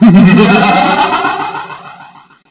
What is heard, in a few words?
evil laugh